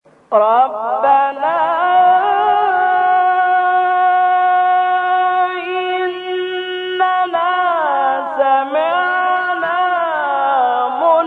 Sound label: rabbana